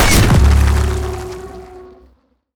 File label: skill stab ground hit